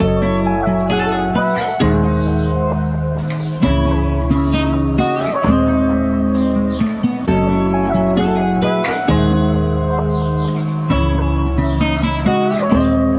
Journey Guitar Loops, 132 BPM C# Min; Italy; Cymatics

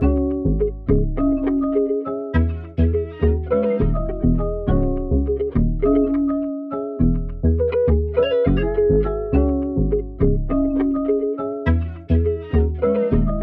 West Coast Melody Loop 103 BPM C# Min; Cymatics; Oracle Sample Pack

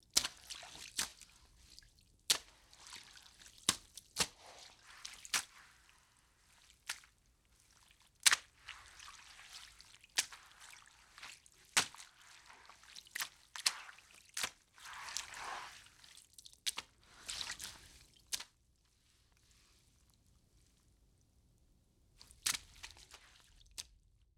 General Mop Movement Water; Fairlight Sound Lib

